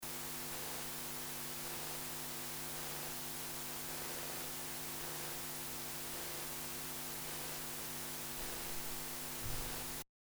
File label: Tascam Model24 write noise stereo track Sandisk Extreme 64GB